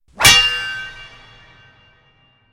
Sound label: sword hits; soundscrate